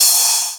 cymbal short

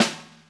Drum Snare

